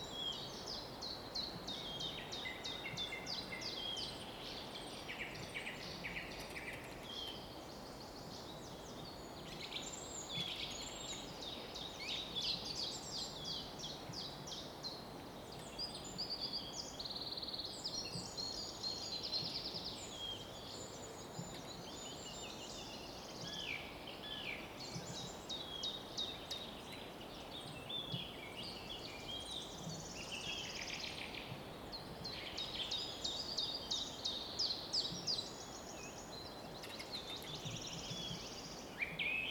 Birds in a Meadow in Spring, Kemeri National Park Latvia